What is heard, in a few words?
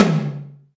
Tom Hi, Alesis DM5